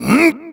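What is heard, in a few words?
effort light, voice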